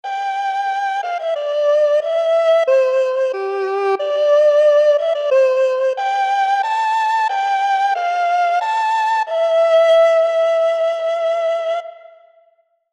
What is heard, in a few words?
erhu; borodine